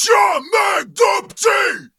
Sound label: Battle Cry, Viking Vocal, Un-Processed; Battle Cries & Phrases; Ghosthack; SkyRealm; Viking Vocal Builder